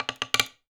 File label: Palette Pulse Train, wooden palette